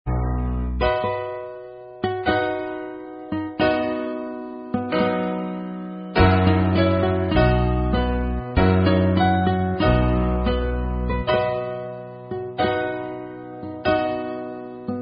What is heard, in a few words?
Karaoke Songs; Girl Scouts